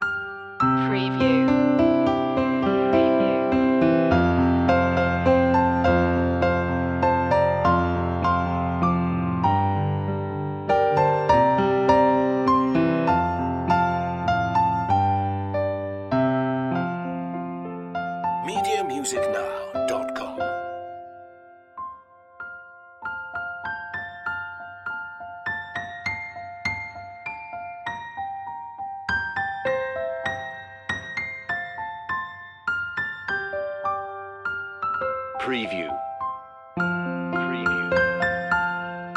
away in a manger piano; media music now

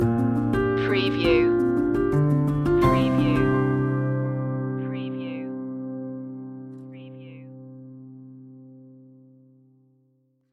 right track stinger

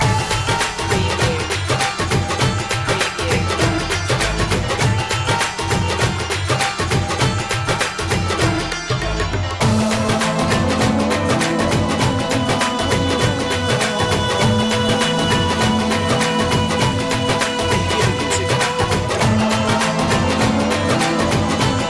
gold sitar

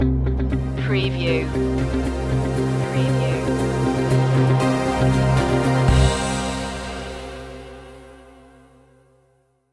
fighting spirit stinger

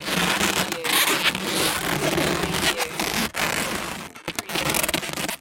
Balloon Animal Twist; Foley sound effects; sound effects bible

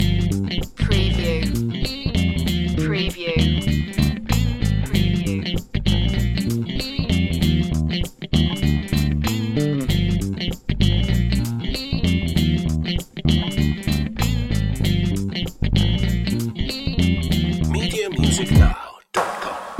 smooth funky love loop

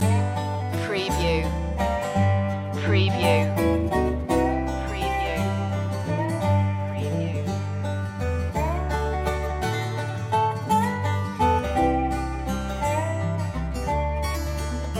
waltz loop

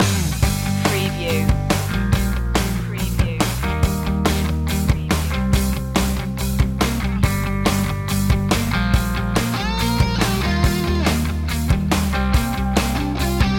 hair metal duke loop

